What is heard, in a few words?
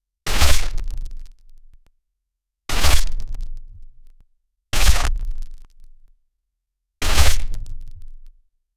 low poly arrow traveling